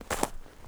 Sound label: footstep trainers snow compact walk fast